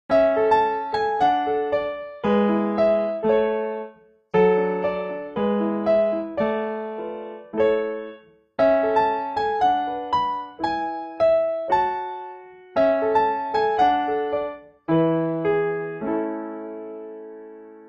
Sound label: Bgm spring event; Cookie Run